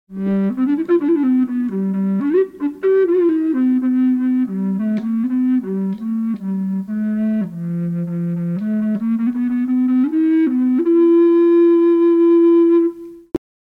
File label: bass recorder